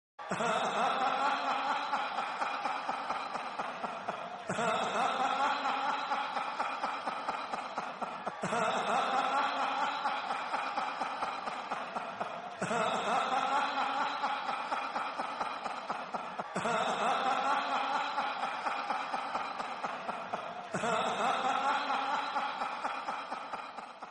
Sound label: laugh; the fiend